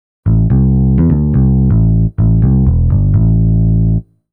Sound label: DEEPHOUSE BASS 125 A